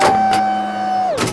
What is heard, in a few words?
pain; alienarena; robot